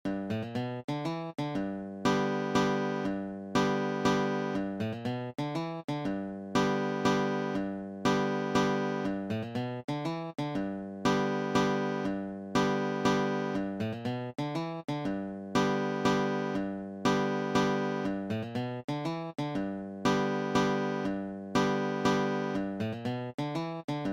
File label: waltz lick; flatpicker